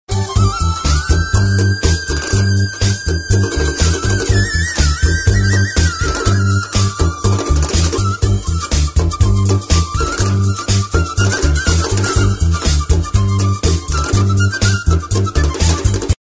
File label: FLUTE RINGTONE; MUTHU